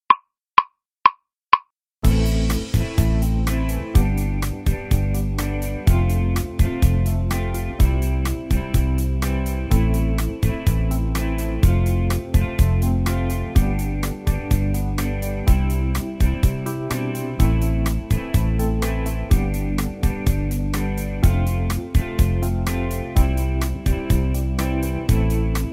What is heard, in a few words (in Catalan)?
karaoke